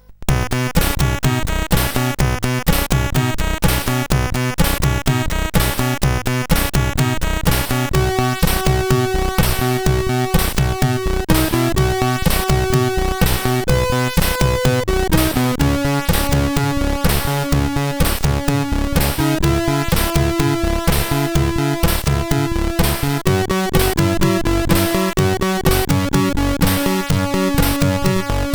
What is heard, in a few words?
Attack to the Spaceship, CSG8580R5; YM2